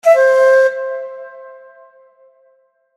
correct; チキンのおもちゃでピンポン。その８; 正解の音・ピンポーン

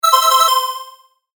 correct; 正解の音・ピンポーン

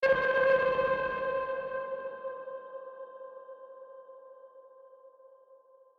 ghost

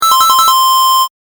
correct; ３連続の正解・ピンポンピンポンピンポンの高音ver; 正解の音・ピンポーン

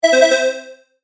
correct; クイズ番組で使われそうなピンポン。中音ver。; 正解の音・ピンポーン

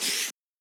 paper

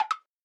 Woodblock

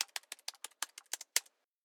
Keyboard

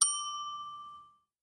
bell

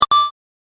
game effect

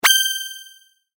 sword

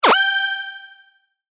sword

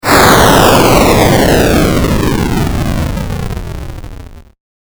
bomb

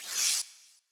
Rip Up Paper

